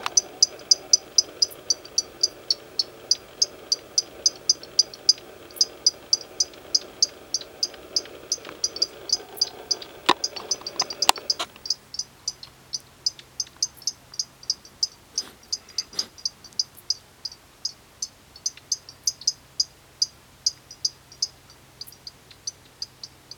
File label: locustella certhiola sharp